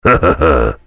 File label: soldier laugh evil